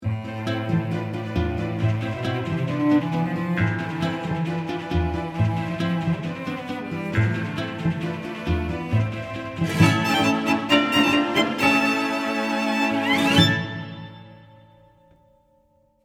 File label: PASADOBLE